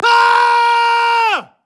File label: male generic warcry; Voices Emotes